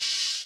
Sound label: Cymbal, Hip-Hop